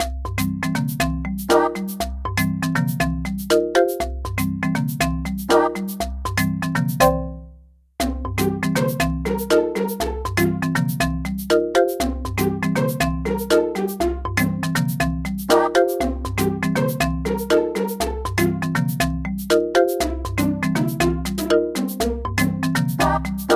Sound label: Jungle; NSMBW